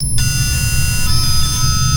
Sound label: sonar Ping Water Close Beacon; Barotrauma; Real Sonar